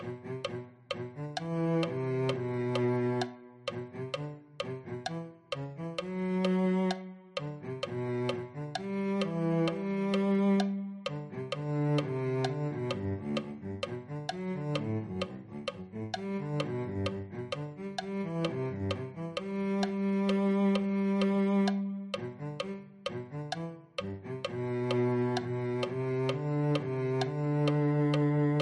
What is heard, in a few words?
cello